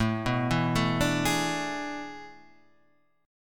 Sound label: A Major 7th Suspended 2nd Suspended 4th